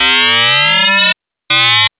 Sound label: Lockdown 1 Pulse